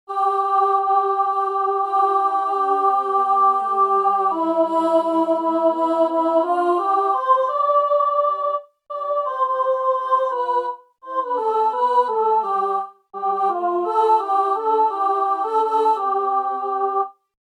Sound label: Chorus